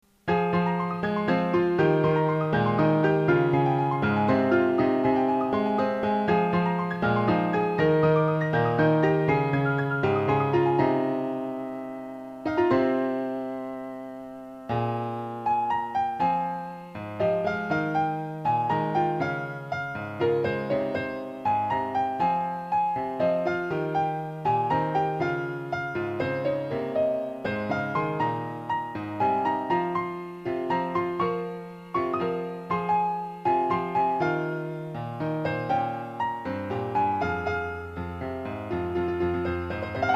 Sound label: piano